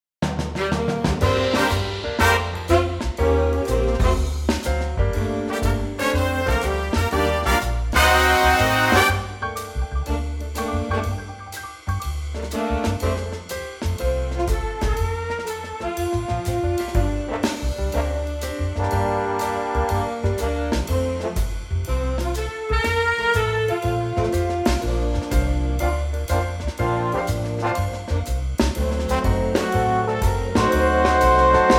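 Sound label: big band G minor; key - Gm - vocal range - Bb to Bb; Superb swinging Big Band arrangement; Punchy brass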